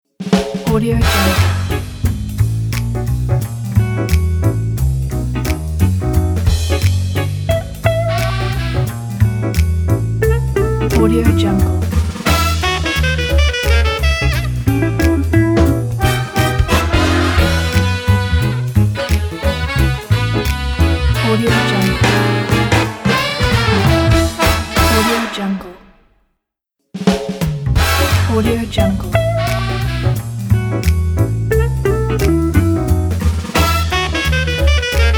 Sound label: logo walking bass